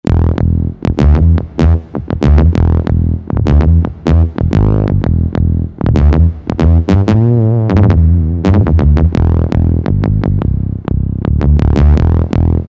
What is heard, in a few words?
Anl Bass; Generalmusic S2R